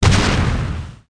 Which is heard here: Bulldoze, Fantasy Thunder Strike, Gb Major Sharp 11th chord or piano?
Bulldoze